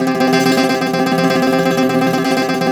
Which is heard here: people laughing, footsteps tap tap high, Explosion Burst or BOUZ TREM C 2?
BOUZ TREM C 2